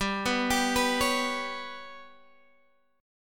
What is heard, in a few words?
G Major Flat 5th, Guitar, Half Step Down